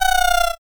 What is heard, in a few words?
goat